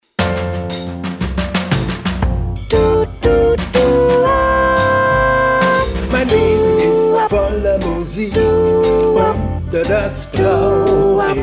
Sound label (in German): Sopran